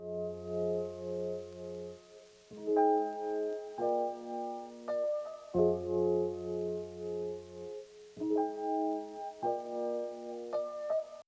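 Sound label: keyboard; USB Soundscan vol.47; AKAI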